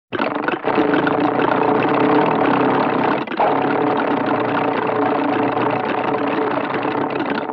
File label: Comedy Motor, Vintage Cartoon Foley